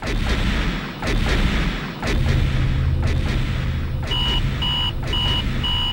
mech steps and alert

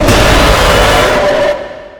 attacking; weird bonnie

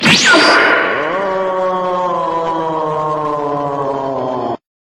teleport; plankton

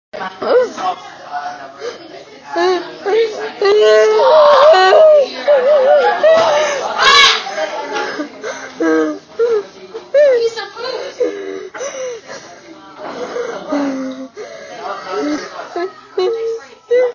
sob to cry

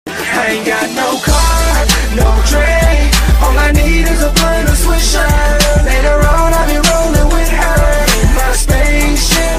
spaceship song

